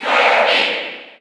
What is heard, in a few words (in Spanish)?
Grito del público, Ingles; Kirby; SSBU